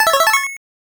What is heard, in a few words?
Coin; Champion Pro Wrestling; SG-1000